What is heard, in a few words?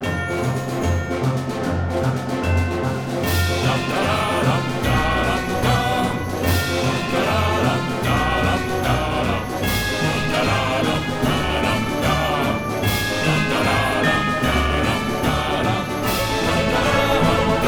Confusion Music; Stanley Parable